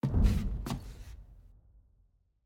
Music Box Exit

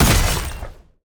Attack Dash; bloom minigame

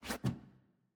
Footstep Basement Wood Rotate

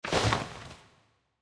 smelter furniture scroll

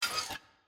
Monkey Bolt; Puzzle

